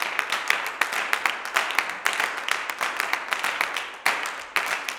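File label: CLAPS 96, Gospel; Blues & Soul; G.CLAPS 96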